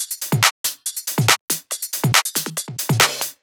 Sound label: drum Loop 140BPM Full; Vengeance - Essential Clubsounds Vol.5; VEC5 Drumloops; VEC5 Multi Loops 140BPM